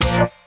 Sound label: Bb STAB; Loopmasters; Funky Underground Vol 2